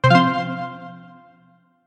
Cool Message

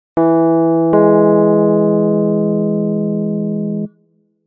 Bus Jingle

